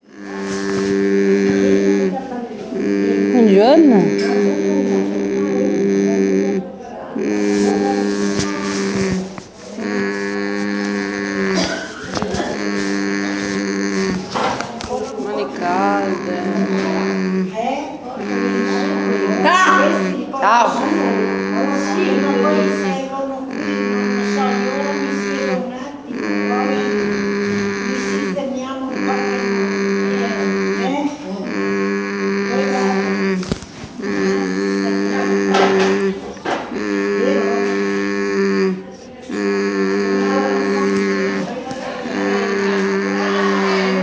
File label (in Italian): vocalizz distruttiva, vocalizzazioni distruttive